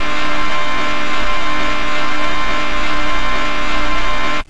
Vehicle Loop Boost; cstrike

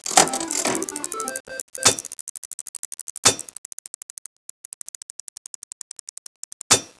slot machine roll; cstrike; next21 crimetown

